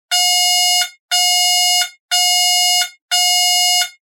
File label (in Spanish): tono